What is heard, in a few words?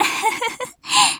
laugh; Eternal Return; Tia; JPN